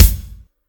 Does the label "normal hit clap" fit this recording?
Yes